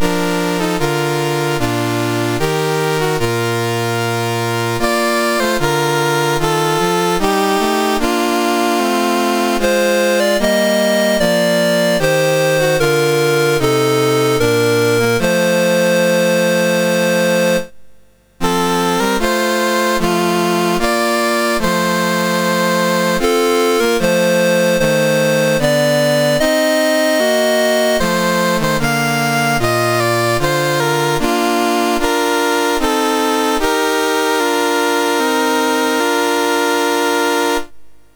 MOS6581R3, National Anthems; Isle of Man

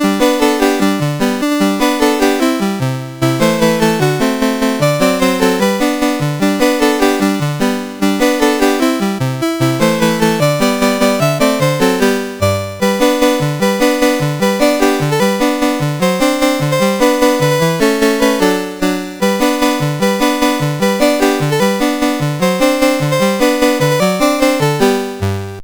Jingle Bells CSG8580R5; Leif Anderson

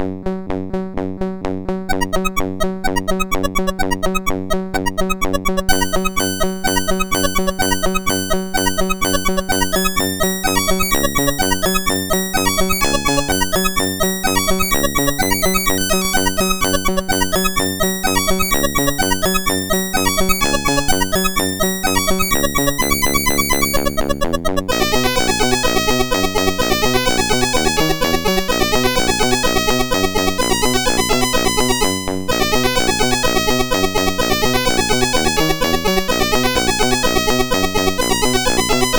sid, MOS6581R4; Bounder

